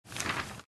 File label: flip